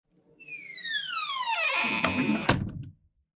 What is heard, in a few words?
creaking door close; environmental